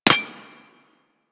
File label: Laser Impact Metal; Surface